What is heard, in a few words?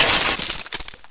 molotov break; weapons